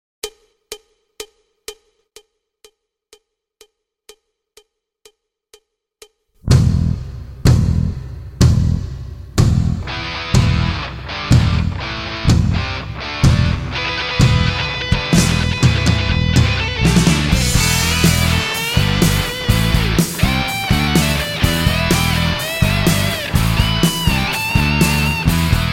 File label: hard rock